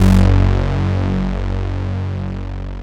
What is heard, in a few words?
Synth, Trip-Hop Loops